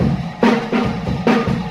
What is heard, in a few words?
most basic classic rock, funky drum loops